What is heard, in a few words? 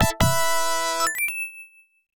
Item Fanfare; Flashback The Quest for Identity; Genesis